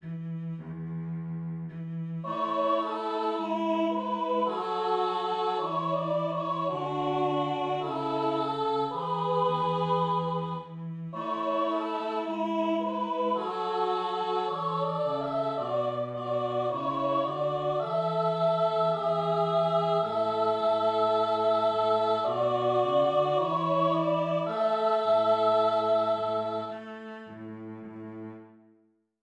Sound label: choir cello; 1부-입례송